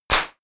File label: clap, percussion